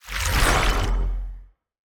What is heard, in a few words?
ui river dir switch